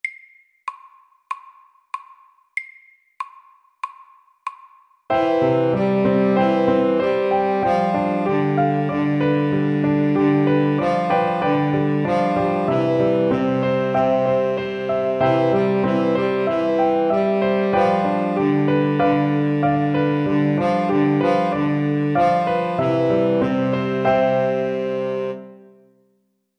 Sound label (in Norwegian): Walesisk melodi MIDDELS